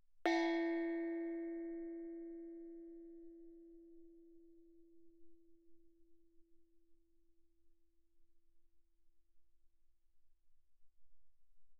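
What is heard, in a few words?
thai gong E4 mezzo forte, Percussion gongs & tamtams; thaigongs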